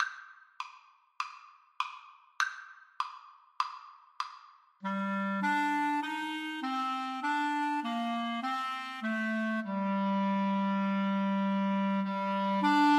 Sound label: Clarinet Duet